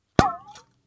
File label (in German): Wasserflasche